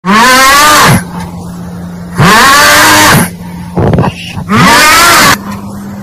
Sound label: sped up ahh